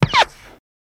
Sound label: Machine Gun Silenced, silenced bursts